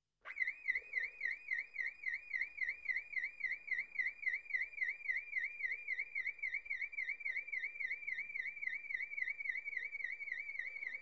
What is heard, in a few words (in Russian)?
signalizaciya avtomobilnaya